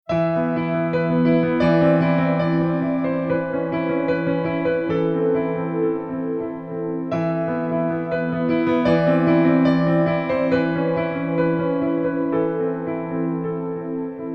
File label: Piano Synth Pad